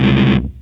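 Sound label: RHODES BEL; GROWL DROP; E-MU Producer Series Vol. 8 – Platinum Phatt; EP FX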